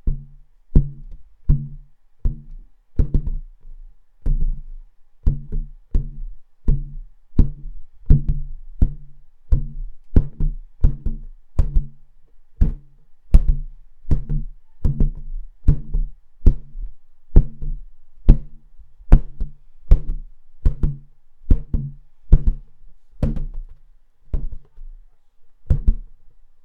balloon bounce; noisemakers; NS Studios - ultra sound collection

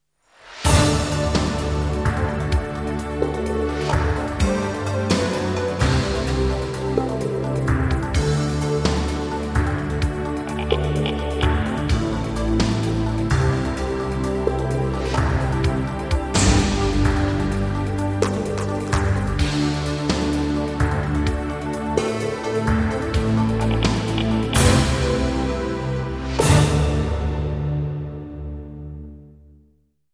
jingles; 90 DDD Jingles

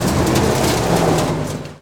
Auto Tires Slide Rock; Automobiles

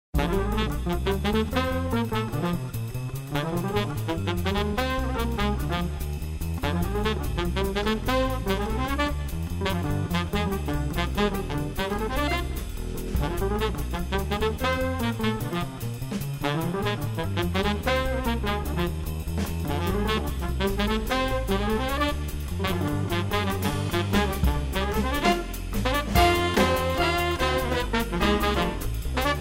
JAZZ STND BE BOP, Royalty Free Music; podcast production toolkit

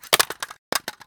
mice wireless drop; Genius; NS Studios - ultra sound collection

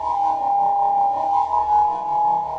Scifi Hover Drone Loop; SFX Kit; Sci-Fi Loops

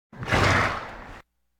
Animal effects snorts grunts farm ambience

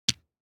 DICE on Felt, Drop, Standard, Five Dice; Samples; ArticulatedSounds; DICE Sfx Party (Extended)

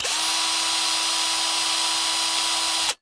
Cordless Electric Drill; Tools; 1001 Sound Effects

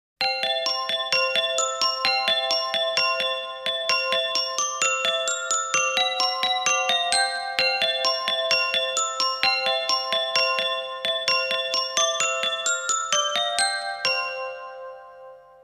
Christmas Jingles; Koka Media Productions; More Christmas Jingles

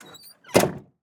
Semi Truck Mac; Blastwave

